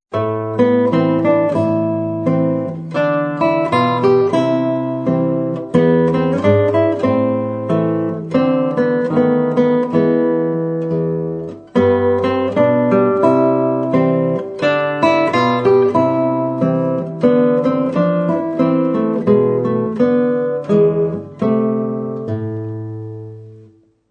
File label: lullaby